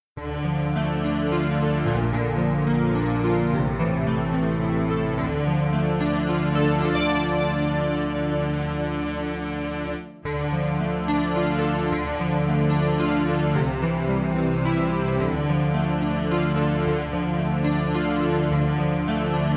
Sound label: Scarborough Fair Bass